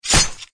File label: cut